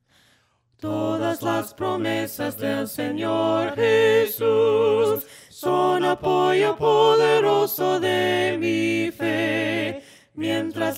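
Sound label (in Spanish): TODAS LAS PROMESAS VOCES, himnos a capella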